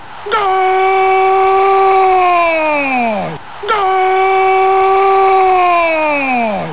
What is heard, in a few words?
goal